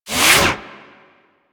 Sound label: Arsenal Sleep To Working; Warframe; Liset; Hub; Machines; Tenno Arsenal